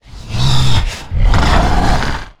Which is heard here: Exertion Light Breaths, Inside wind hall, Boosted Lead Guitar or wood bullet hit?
Exertion Light Breaths